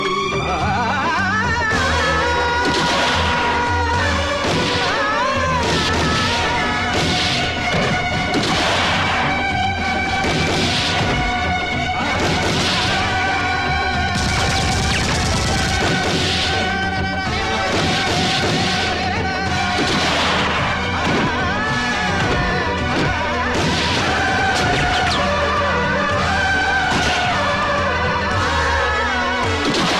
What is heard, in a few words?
Ringtone